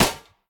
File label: shield grab; smite; lancelot